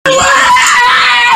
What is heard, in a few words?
boy noise